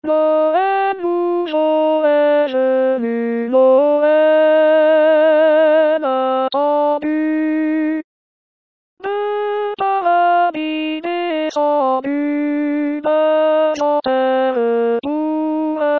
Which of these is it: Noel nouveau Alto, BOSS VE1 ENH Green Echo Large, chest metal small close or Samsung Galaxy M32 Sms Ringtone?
Noel nouveau Alto